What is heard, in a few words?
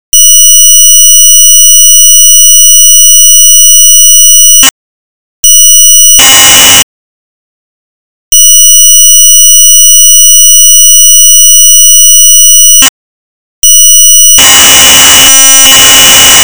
3600 baud